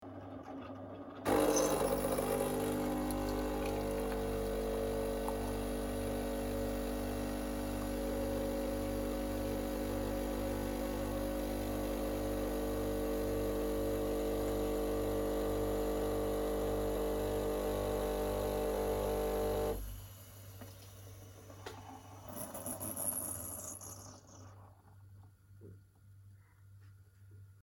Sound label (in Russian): kofemashyna domash